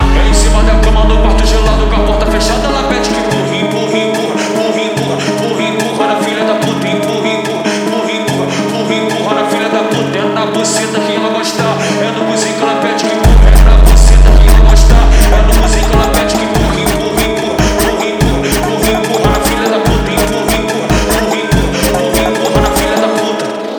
BAILE PHONK

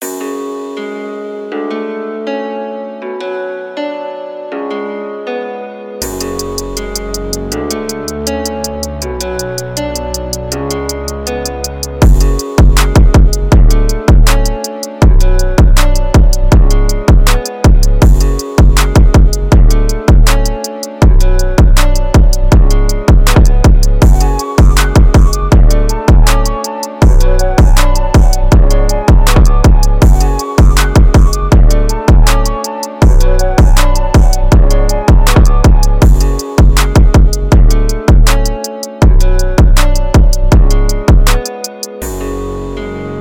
160bpm F m

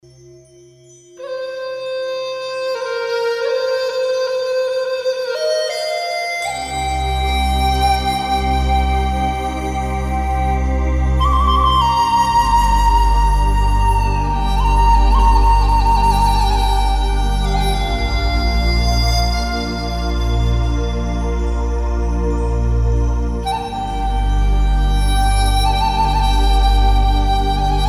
Japanese Music

